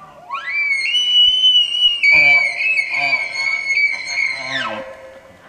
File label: big bull close, elk